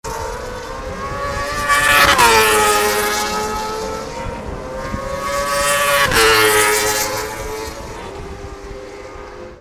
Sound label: Racing Sound